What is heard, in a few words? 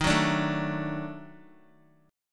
Eb Minor Major 7th Flat 5th, down, Guitar; strummed; 4F,4A,5C,5G,5C,6E; chord; JGuitar